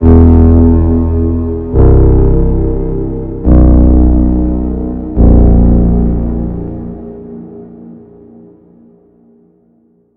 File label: Bass